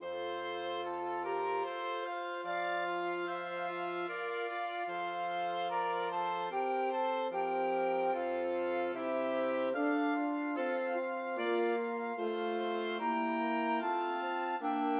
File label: Danse des Champs Elysees 5tet